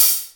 Hats Real Open

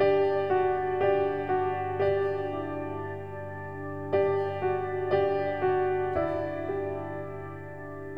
piano, Sad

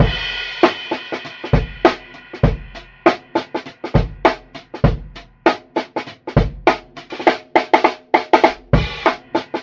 turntable spins, drum loops, funky

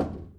bowling pin

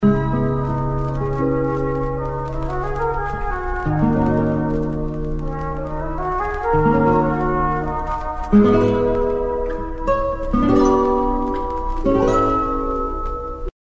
dreamy flute

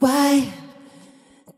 "why" sung; questions

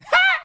"ha", Voice

